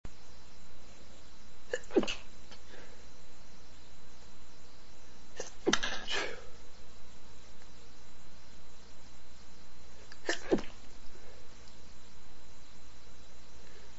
lots of stifles, sneezes, male

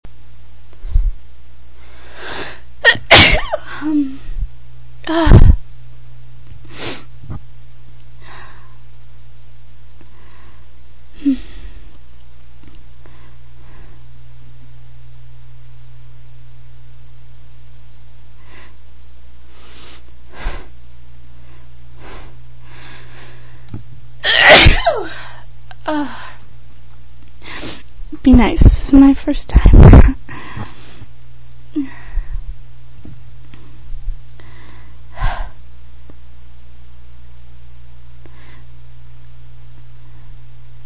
allergies, female

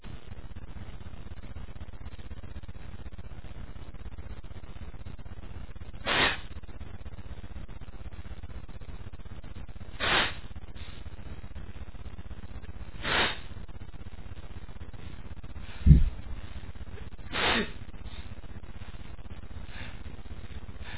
pollen, male, achoo

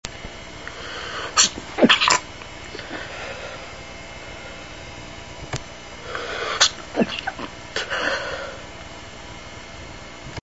stifles, male

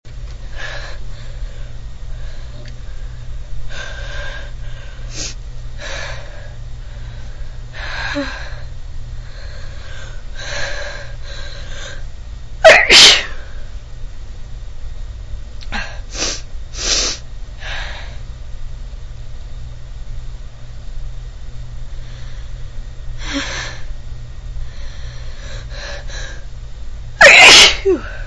buildups, female

